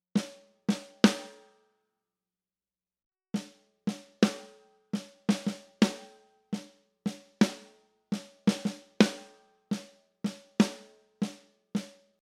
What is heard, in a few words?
snare original